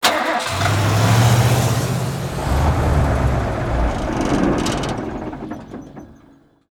van, terrorist; Counter Strike Model Pack; CS:GO; vehicles insertion

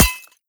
glass, bullet impacts; physics; Half Life Model Pack

